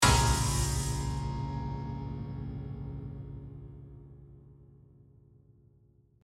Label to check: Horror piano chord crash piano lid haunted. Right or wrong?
Right